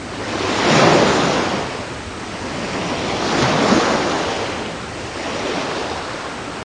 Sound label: ocean